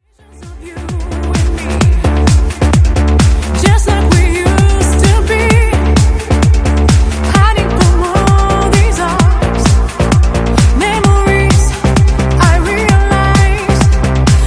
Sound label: Trance